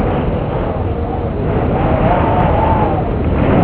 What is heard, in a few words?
wind